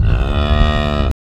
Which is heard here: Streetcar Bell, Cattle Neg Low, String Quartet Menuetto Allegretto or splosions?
Cattle Neg Low